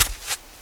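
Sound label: step rock left, Walking on rock with your left and right foot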